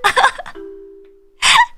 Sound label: Laugh; Ninomae Ina'nis